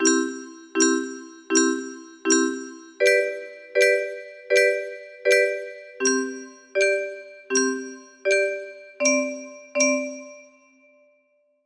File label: music box, music box melody